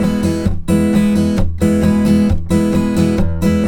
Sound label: Blues Guitar Samples, RIFF; ILIO; Fretworks